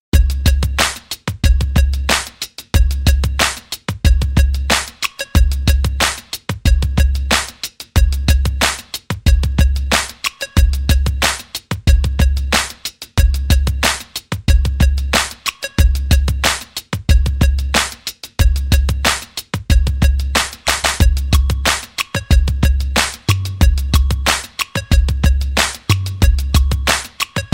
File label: beats loop track bpm 92